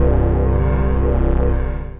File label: Ectomorph Bass, brooding bass; Instruments; Ableton; Mood Reel